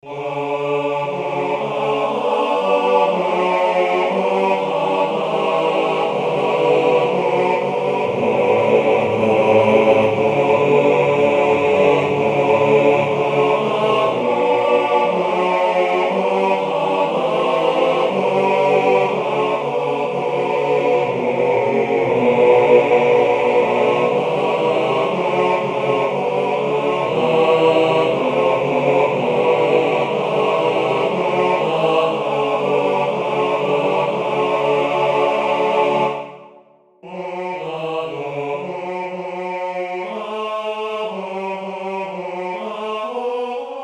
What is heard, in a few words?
satb, church choir; brother james's air; Original Compositions for Church Choirs